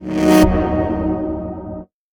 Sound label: Boss Attack Chord